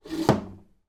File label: toy table football bar slide single